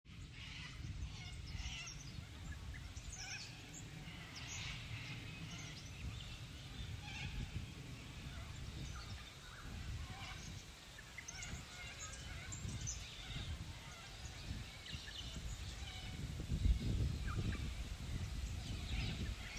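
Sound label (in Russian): шумы фермы какаду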